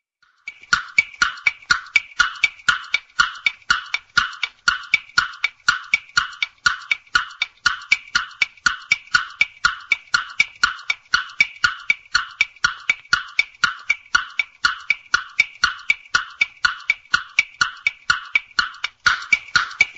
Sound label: Cartoon Clock